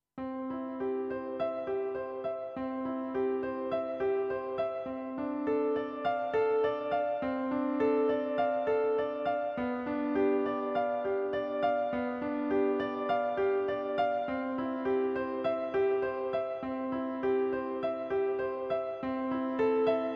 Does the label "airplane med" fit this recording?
No